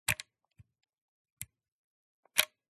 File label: Camera Film 35mm SLR CANON A1 Film Rewind Knob Crank Pull Up Pull Down; MKH8040; PMSFX